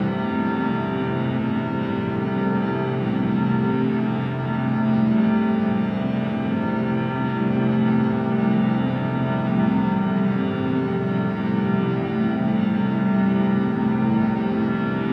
C Piano Drone, Instrument Drones - Ambient Textures